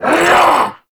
Vocal Attack Short; Warframe; Duviri; Dax

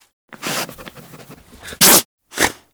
Kubrow Tame Sneeze B; Warframe

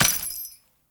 Step Default, Footsteps; Enemies; Foley; Warframe; Grineer Royal Jester

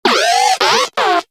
Moa Compassionate Vocal Enemy Spotted; Warframe

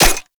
Vial Ability Orb Spawn; Warframe; Warframes; Alchemist

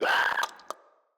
Infested Runner Hear Sound

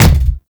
Sphere Projectile Bounce; Warframe; Gyre

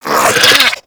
Infested Biped Alert; Warframe

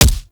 Weapon Punch Shotgun; Warframe; Weapons; Shotguns

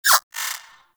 Power, Akimbo Pistol; Grineer; Prisma Twin Gremlins; Weapons

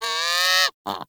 Sea Bird Vocal Captured Idle; Characters; Warframe; Ostron; Hunting Critters

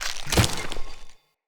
Foley Knock Down; Enemy; Characters; Corrupted Sproutfolk

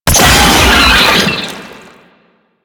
Proto Infested Pain, Vocals; Warframe; Brachiolyst

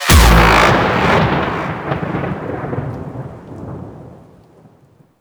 Beam Fire; Gameplay; Mission One; Condrix; Warframe; The New War

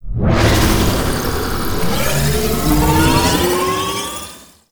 Teleport Cast; Gameplay; Warframe; NineteeenNinetyNine; PvPvE

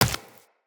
Run Flesh, Footsteps; Characters; Tenno

